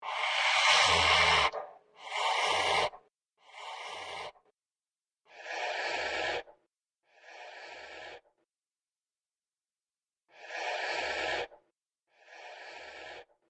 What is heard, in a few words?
breathing loop